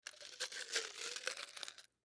Coins Movement, Coins In Cup Dig; Foley; Blastwave

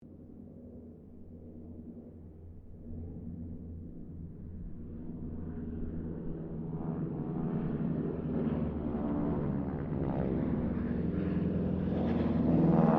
Harvard squadron 4 pass, stereo

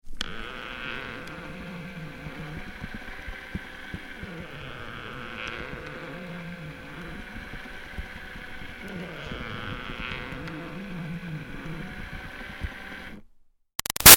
Toy Baby Doll Motorized Moves Plastic Creaks; Miscellaneous Toy; Toys; BigRoomSound

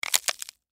Horror Bone Finger Break Impact Human; Injuries; Combat; Blastwave

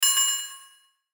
Hit Bells High Delay; Blastwave

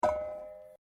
Bowl Metal Placing; Various kitchen bowls; Metal (Sound Effects:Materials)

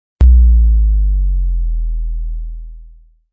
Bass Dive

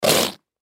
Whoopee Cushion, Whoopee Cushion Fart Hard; Miscellaneous Toy; Cartoon; Toys; Blastwave

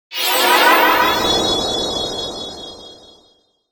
Shooting Star Fly By Burst; Sci Fi; Production Element Imaging Element Accent Transition; Blastwave

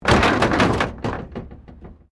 Wreck Door Panel Clunk Metal

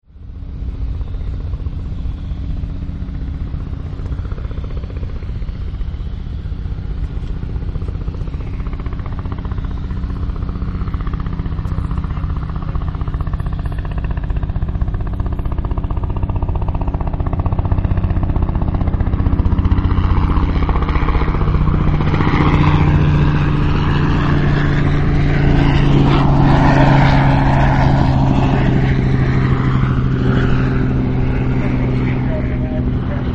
Helicopter, Helicopter Flying Overhead; Helicopters; Vehicles; Helicopter Sound Effects

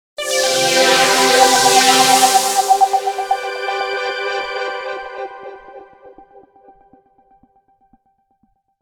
Logo Sweeper Shimmer Delays; Sonic Logo; Blastwave

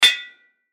Impact Anvil Metal Hit Ring; Sound Effects:Materials; Blastwave

